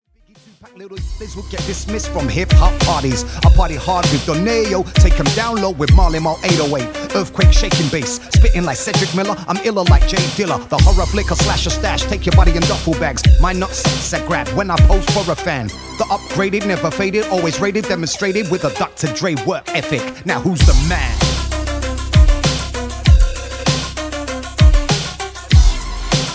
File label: vocal single